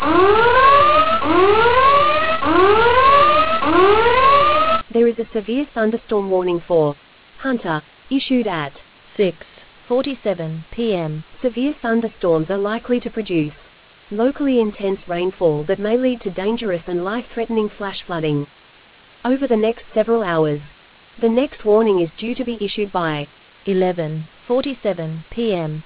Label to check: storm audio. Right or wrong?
Right